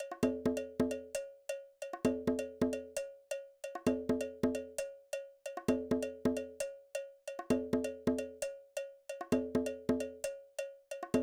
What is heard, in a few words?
hand percussion